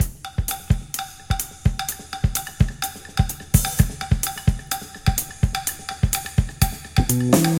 127 bpm drum beat e key, Free drum beat - kick tuned to the E note. Loudest frequency: 2935Hz